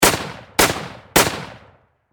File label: Warfare Rifle M1 Garand Semi Automatic Triple Shot Close Perspective; Rifle Sound Effects; SFX Bible 6